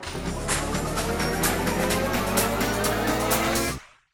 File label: near win; trollpot